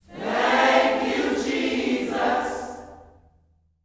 THANK U JC, Gospel

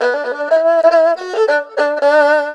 ERHU LICKS; Best Service ProSamples vol.52 - World Instruments 2; AKAI

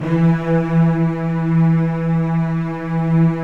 Virtuoso Strings, E2; ILIO; Kirk Hunter Virtuoso Strings VOL-2; 90 s Sample CDs

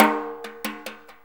6-8 Brush, Perc Loops

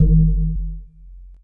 JUG HIT

